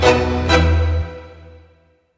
final de tango

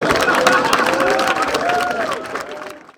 aplausos con risas

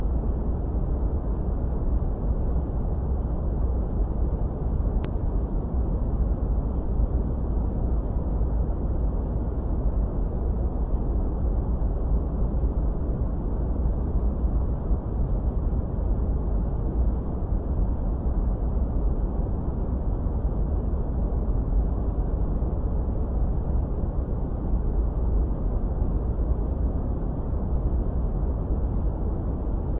sonido blanco

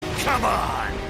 golpe; armstrong

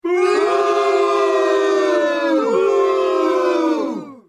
abucheo; coyotes

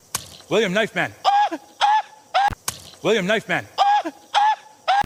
apache indio gritando; apache challenge

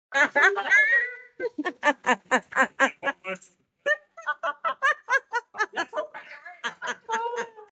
risa malvada

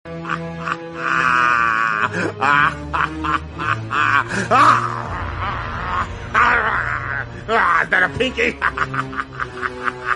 gato risa malvada

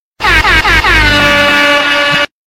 entrada regaeton

